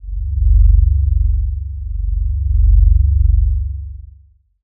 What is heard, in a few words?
drone